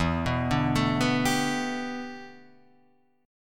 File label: G Major Add 9th chord; Gadd9/E arpeggiated; JGuitar